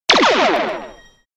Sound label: laser weapon; family guy